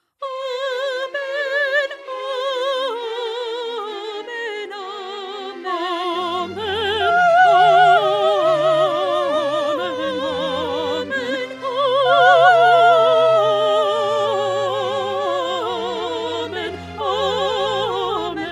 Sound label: Stabat Mater Concert Version; Pergolesi